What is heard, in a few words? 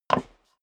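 step dress boots wood creak single stair up, footsteps; schritte; menschen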